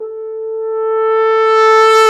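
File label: brass, F.Horn Solo; Roland L-CDX-03 Disk 2; F.Horn FX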